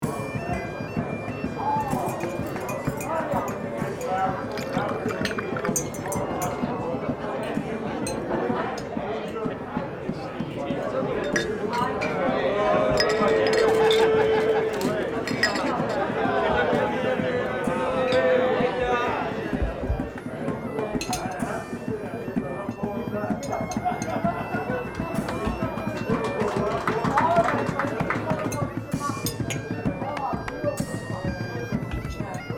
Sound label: Tavern ambience medieval people chatter and music